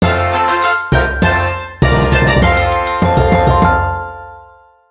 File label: Jingle Success; Travel Adventures with Hello Kitty; 3DS